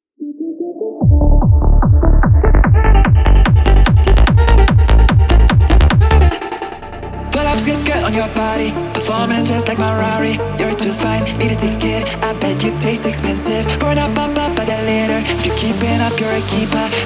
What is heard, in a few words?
hypertechno